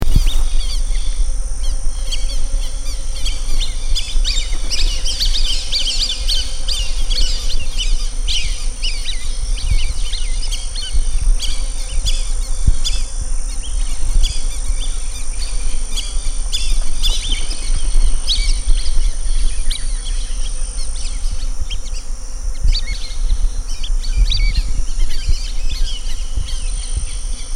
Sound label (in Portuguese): canto da asa branca